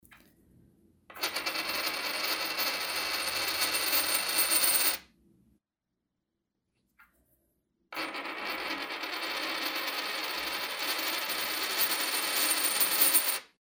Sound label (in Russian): dva varianta krutitsja krupnaja monetka